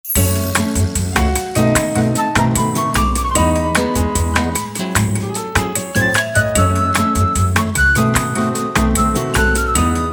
BGM Elegant

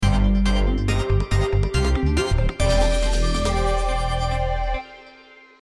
Jingle Clear; Micross; Picross

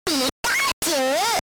Pikachu SFX; RBY

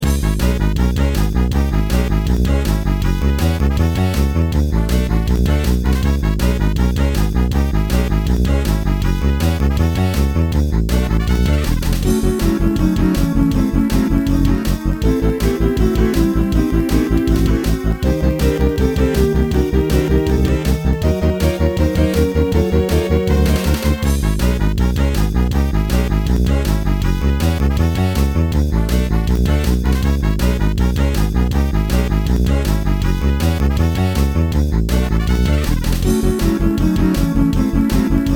Battle; Go-Rock Squad Grunt Strong; Ranger

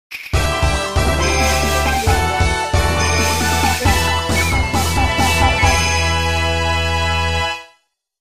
Fanfare (Battle Won); Conquest

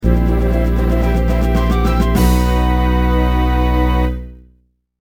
Fanfare Misson Complete; Ranger

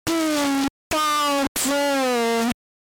Pikachu SFX; RBY

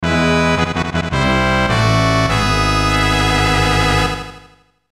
Unused Fanfare; FFVI

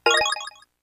Sounds Effects

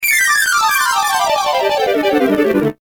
Fanfare Encounter; Ranger

